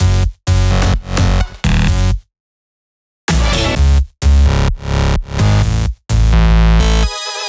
Sunday Bass 2 Root F#, 128BPM; Vengeance Samples; Sunday Kit

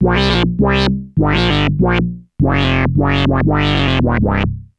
ARP Odyssey, Arp A Line, synth samples

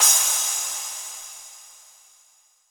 Crash; Vengeance Samples; VEE Cymbals

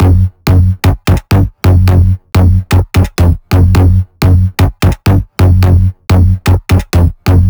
128BPM Melody; Vengeance Samples; VFH1 128BPM Bones Kit

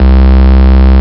Ultimate Bass Root C; Vengeance Samples

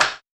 Snare; Vengeance Samples

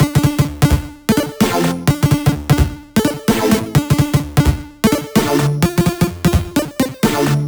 128BPM Melody; Vengeance Samples; VFH1 128BPM Wanted Kit

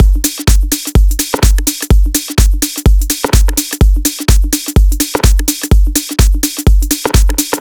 126BPM Connectors Drums, TechHouse; Vengeance Samples; Bonus TechHouse Kits

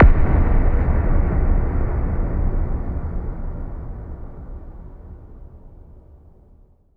Hits & Explode

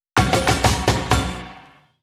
Fill 128 BPM; Vengeance Samples; VEH1 Loops

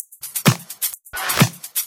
House loops 128BPM; Vengeance Samples

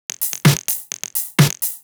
Electro Loop 128BPM; Vengeance Samples

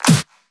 Pre-Shifted Claps; Vengeance Samples

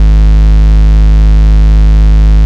Ultimate Bass G# Root G#; Vengeance Samples

